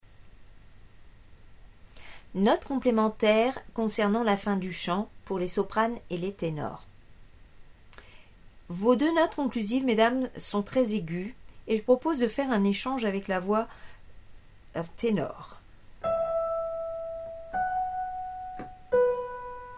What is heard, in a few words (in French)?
soprano et tenor